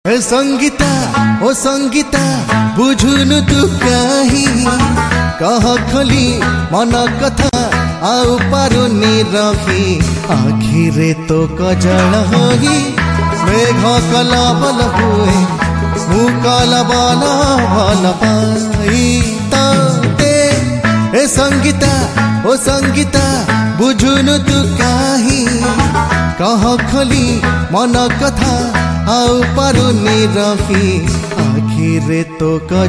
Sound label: Odia Song